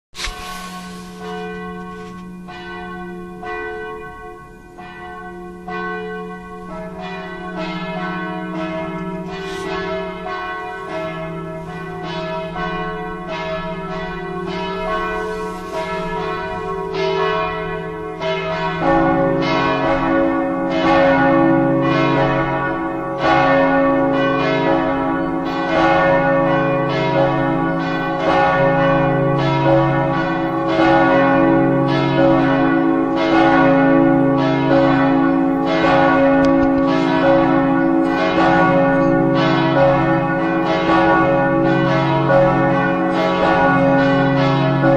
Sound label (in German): volles Gelaeut; Hamersleben